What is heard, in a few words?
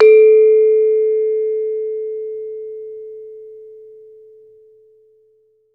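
METALOPHONE, Pitched Percussion; E-MU Producer Series Vol. 7 – Old World Instruments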